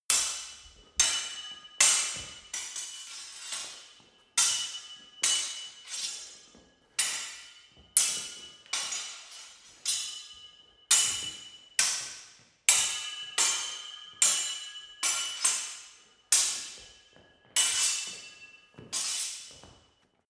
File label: sword hit sword sequence long